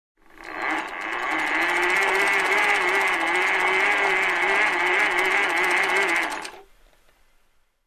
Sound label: Unwinding Rope